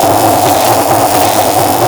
Antimatter Drop Loop; Warframe; Warframes

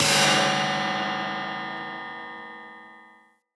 Light Mirror Hit Negative C; Puzzles

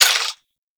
Mech Add, Weapons; Warframe; Tenno; Protea; Velox Prime; SMG

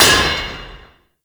Worker Hammer Impact Metal; Warframe; Grineer

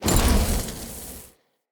Casting Device Charge Heavy Start; Weapons; Player; Fenn; Casting Devices

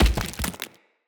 Mockery Body Fall Default; Enemy; Foley; Mockeries; Characters

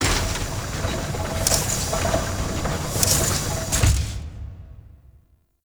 Misc Machines; Ambience; Warframe; Grineer; Asteroid

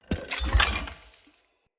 Collector Bomb Plant

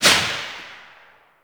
SMG Tail Indoor; Warframe; Tenno

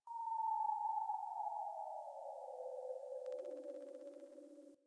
Venus Landscape Distant Corpus Texture; Warframe; Venus Open World

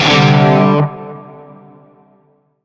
Shawzin Pent Maj Chord; Warframe; Narmer Shawzin; Chords

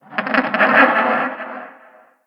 Creature Alerted Call Far, Wildlife; Midrath Island; Ambience

